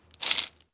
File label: foot scuff concrete